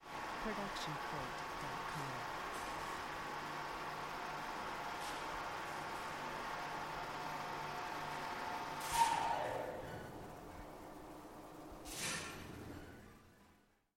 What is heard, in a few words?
elevator mechanism wide; soundscrate